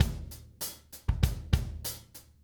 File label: 098 Steady Drums; Pop Rock; Moderate Tempo; Pro Tools Essential Loops; Digidesign